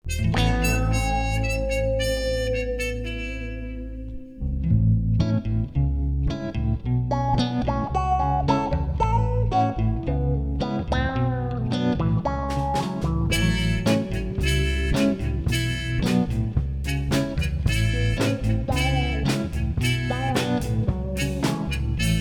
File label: whimsical falling in love mix